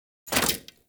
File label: Rifle Mech Release; Warframe; Weapons; Thanotech